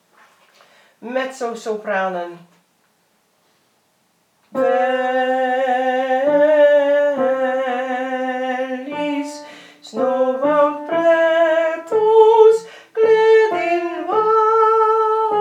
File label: mezzo sopr., vrouwenkoor